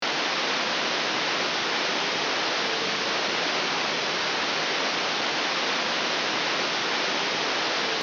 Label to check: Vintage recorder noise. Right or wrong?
Right